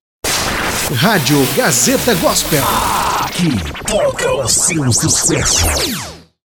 vinheta; vinhetas gospel

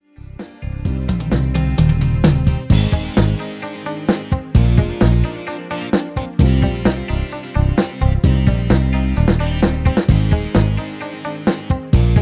amostra rock pop

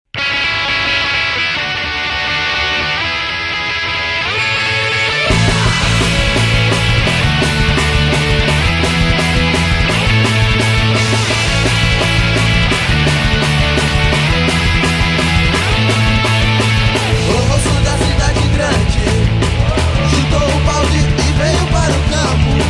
punk rock rural

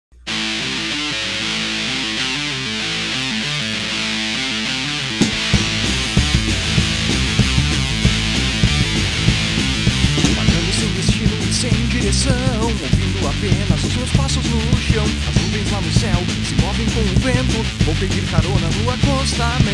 rock estrada

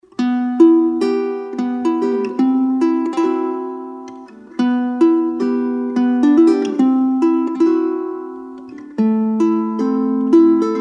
baião de cuatro instrumental

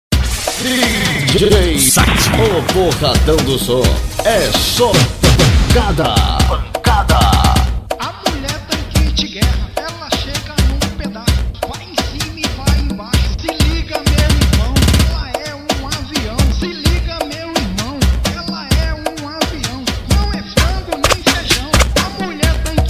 funk novo

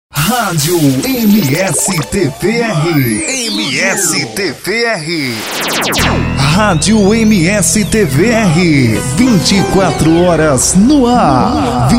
vinhetas radio

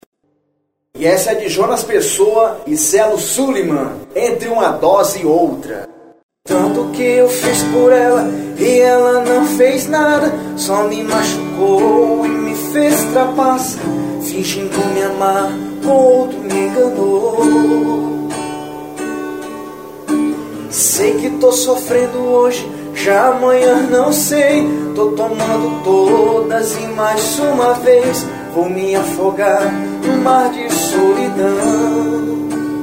cello

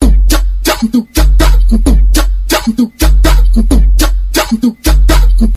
base beat com grave; grave bolado

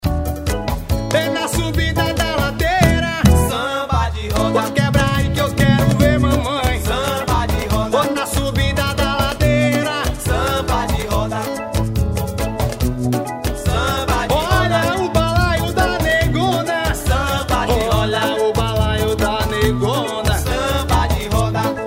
samba de roda

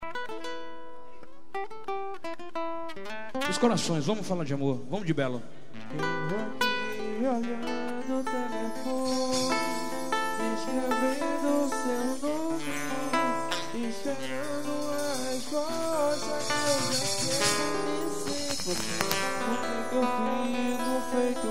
samba, telefone

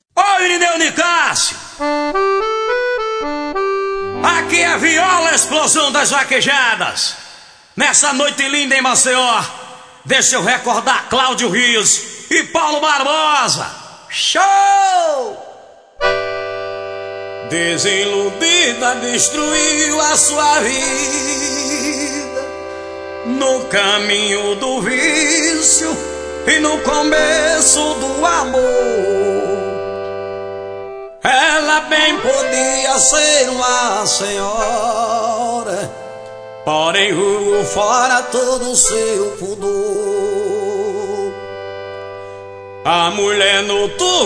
viola toada

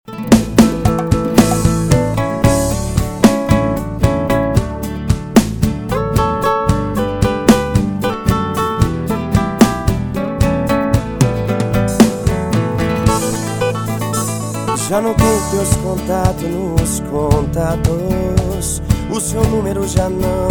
sertanejo universitario

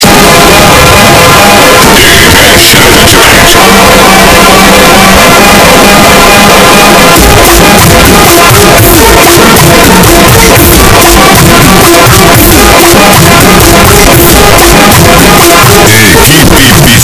funk bass

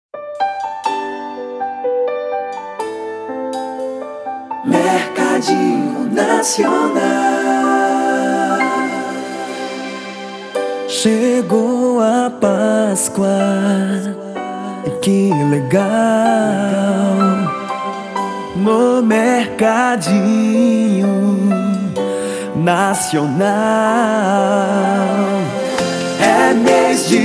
jingle pascoa; kazam studio; mercadinho nacional

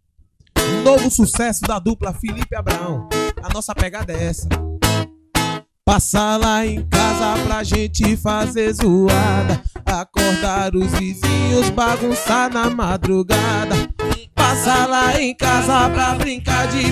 sertanejo

